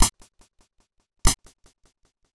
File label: hihat